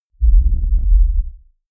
deep sub rumble short

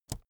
keyboard laptop space push soft

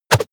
whoosh air hard fast

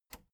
plastic button latch loose release enable

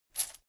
chain mail armor movement

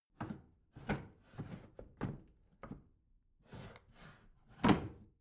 bathroom drawer open rattle

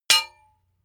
hammer hit metal

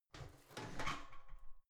garbage can open lid squeak

